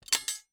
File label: Sword Drop